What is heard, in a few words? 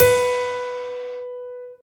normal hit whistle; osu!